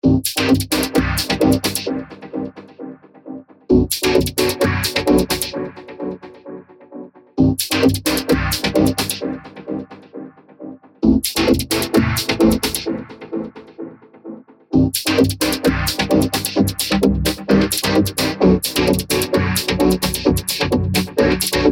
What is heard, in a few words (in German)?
Arturia V Collection 8 test soundbeispiel vocoder